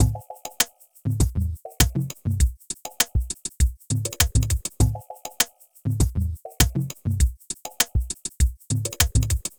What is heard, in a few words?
Beat